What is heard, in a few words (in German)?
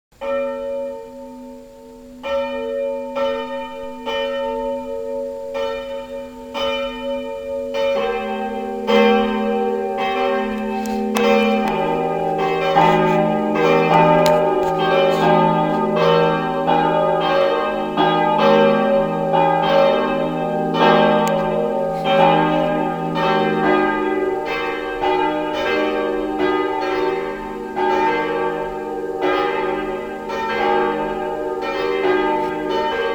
glocken